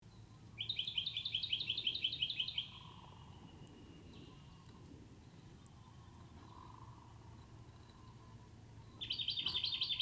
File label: Microeca Fascinans, bird species; Jacky Winter